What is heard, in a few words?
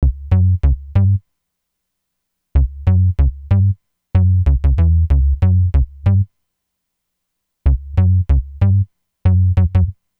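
094 Chill Pop Synthbass F# major; Pop Rock; Digidesign; Pro Tools; Pro Tools Essential Loops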